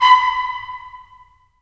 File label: BRS, Tps Stacc; Roland - LA Composer vol. 4